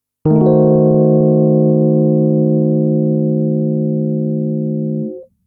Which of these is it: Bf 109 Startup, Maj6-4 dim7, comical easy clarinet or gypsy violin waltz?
Maj6-4 dim7